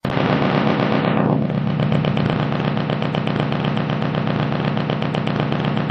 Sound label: Footstep